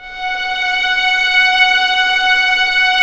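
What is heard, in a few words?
violins, piano, orchestra; String Master Series; Vlns 7 Orch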